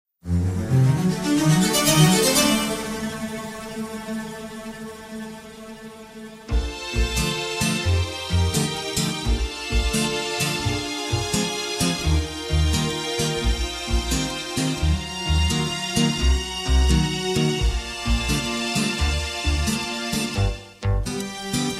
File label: Bb No Backing Vocals, backing tracks